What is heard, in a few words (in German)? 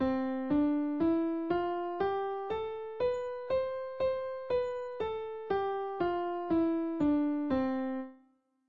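Übergreifen rechte Hand, klavier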